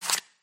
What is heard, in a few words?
card slide